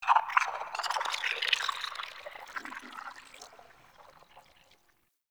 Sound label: Tunnel Critter; Warframe; Tau